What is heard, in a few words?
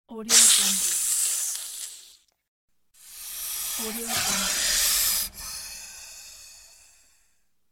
snake hiss